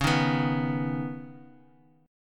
C sharp 7th Suspended 2nd, C sharp, down; C#7sus2; strummed; JGuitar

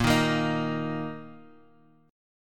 D sharp 5th A sharp

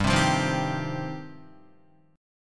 G 7th Suspended 4th Sharp 5th, Guitar; G7sus4#5 strummed

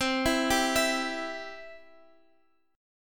C Major, Guitar Open F; arpeggiated; JGuitar

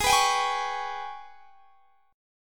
G sharp Minor Major 7th Double Flat 5th; G#mM7bb5 strummed; x,23,21,24,21,21

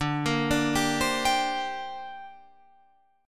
D 7th Suspended 4th Sharp 5th down Guitar Open F; D7sus4#5; arpeggiated

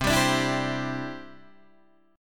C Major Add 9th, Guitar Open D

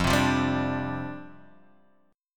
F 6th Suspended 2nd F, chord; F62 Chord {1 3 3 0 3 X} strummed

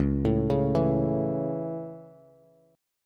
Db Minor Sharp 5th, Bass; arpeggiated; 9,12,11,9

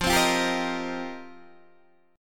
F 7th Suspended 2nd Suspended 4th F Guitar Open D

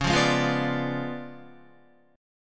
Db 7th Flat 9th, Guitar; Db7b9 strummed; JGuitar

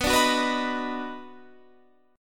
D sharp Minor Major 7th Sharp 5th B, chord

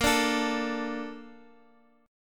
A sharp Major 7th, Guitar Open F; A#M7; strummed; JGuitar

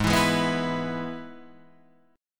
G sharp Minor Sharp 5th, chord; G#m#5; {4 7 6 4 5 7} strummed

